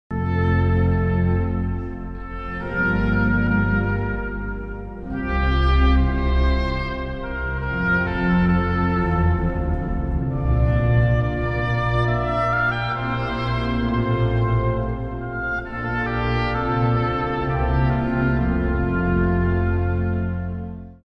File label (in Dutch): Instrumentaal Lascia chio Pianga; Het koor